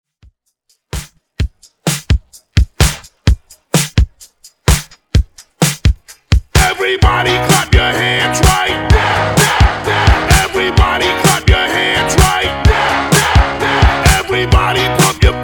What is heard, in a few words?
intro Hype 128Bpm; Redrum